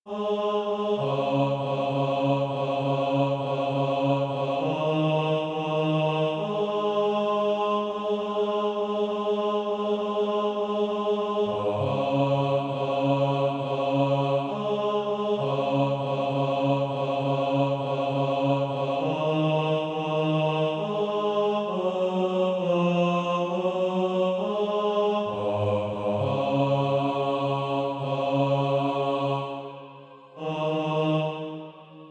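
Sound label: bas, Practise Music; Christ Has Arisen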